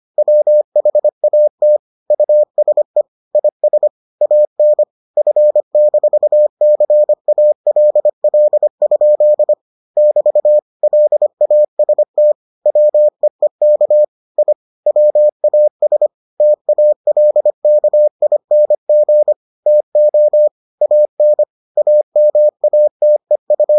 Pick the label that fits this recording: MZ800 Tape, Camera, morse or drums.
morse